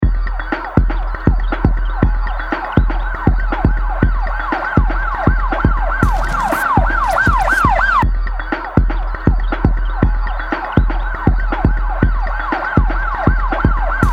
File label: Funky police Siren; Ringtones